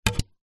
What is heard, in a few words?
household cd disc set down hard surface